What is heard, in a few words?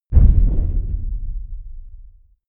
big metal impact low dull